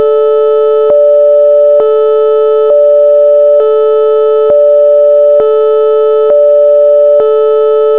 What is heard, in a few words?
siren; LTspice IV